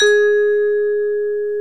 VIBES BELLS

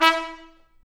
BRS TP, Roland, Stabs; Roland L-CDX-03

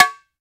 E BONGO S, World Percussion

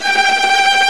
TREM STRINGS

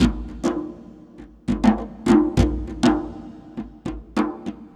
Djembe; Sonic Foundry (Sony Creative Software); Ambient Atmospheres & Rhythms

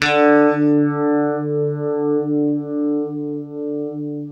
UNIVIB D, Guitar; East West; Steve Stevens Guitar Samples Collection

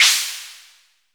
CRASH, DRUMS; Music Store Sound Service; Einstein

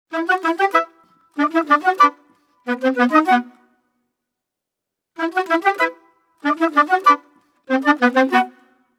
95 e min woodwinds, Orchestral arrangements

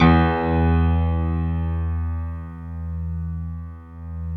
E1, PNO; Northstar - New Gold VOL-1; HLYWD PNO

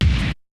Kick; ZERO G - Datafile DISC 1

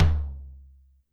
Tom Soft, YAMAHA RM1X

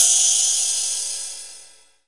ZYMBAL, PERC; NorthStar - The Wizard VOL-2; TSAR PERC

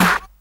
SD, Dance Drums

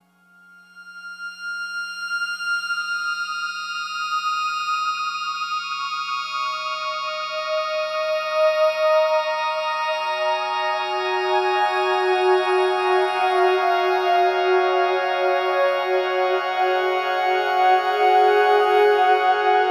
Long Hi Complex, Complex Wave; Sonic Foundry; Sony Creative Software; James Johnson Spektral Minimalism

